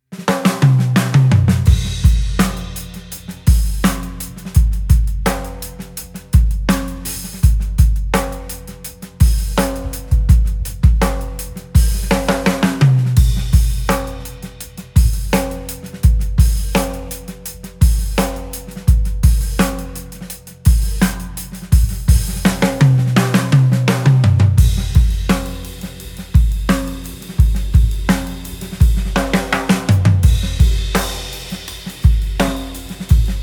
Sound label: backing track; rbhDrums monarch series review; modern drummer